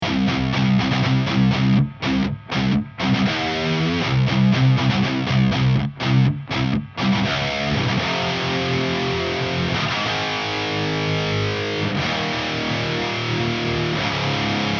amp no back; peters mako mesa krank splawn

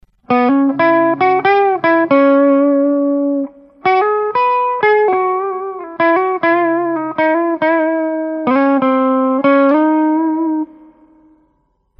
micked cab, einstein head 100w

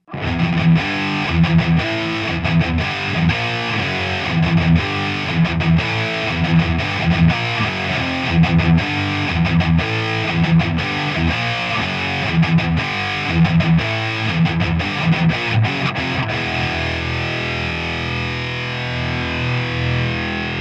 vh4 treb 2 2db 2 4k 2 inches grille

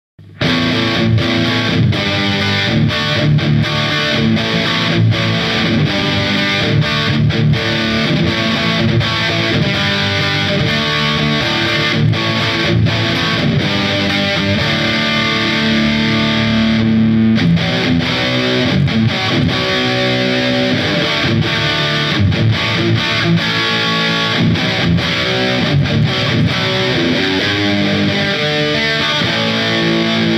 v30 jmp, marshall; marshall yjm100 blackstar ht dual

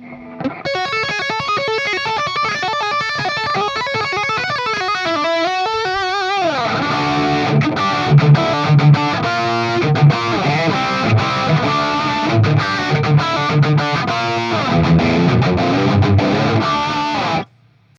upgraded block

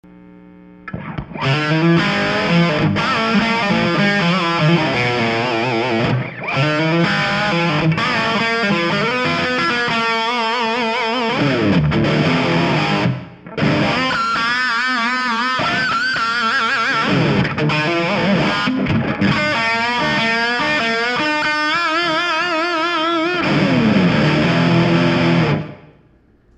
superquiet, budda sd30 combo

